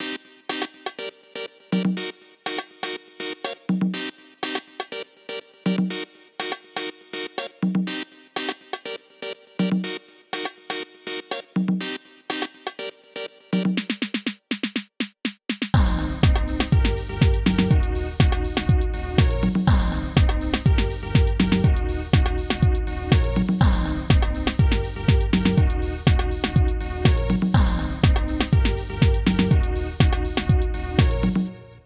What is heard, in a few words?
Airports 122 bpm, Old Skool House; Big Fish Audio; OSH Construction Kits